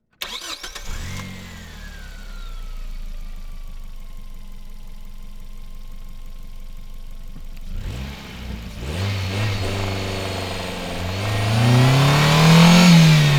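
Ford Probe Onboard Start Fast Drive Stop Reverse Stop Drive Stop Off Engine Right; 1995; Pole Position; PZM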